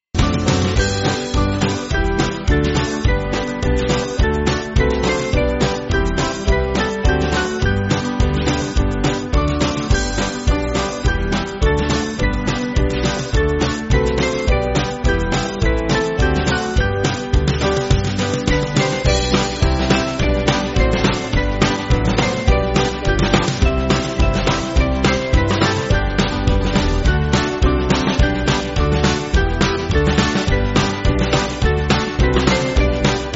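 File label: Band, Music Backing Files for Churches; Hail To The Lords; British Grenadiers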